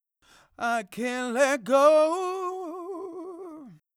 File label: Backing Vox